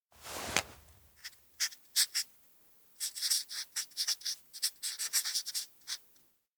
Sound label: kanji write forehead pen; anemoi